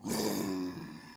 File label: zombie idle; zombies